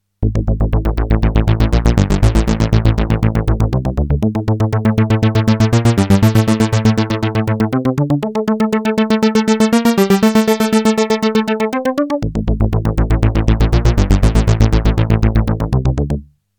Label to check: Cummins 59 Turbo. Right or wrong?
Wrong